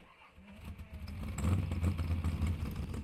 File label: start engine; furious road